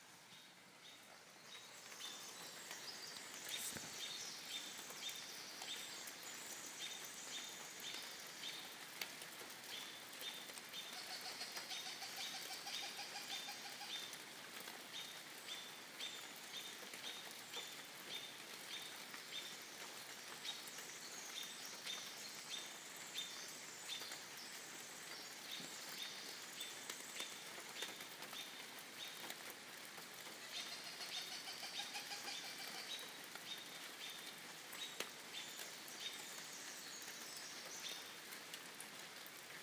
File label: chipmunk chirp